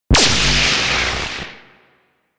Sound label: Corpus Powerplant Tesla Coil Hit; Ambience; Warframe; Corpus Railjack; Tether Power Plant